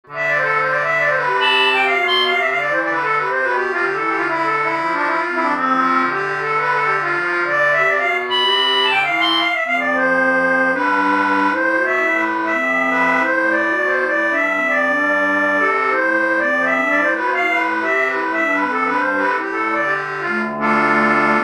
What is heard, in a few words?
Reed Organs Magnus 594 ext FX; Reeds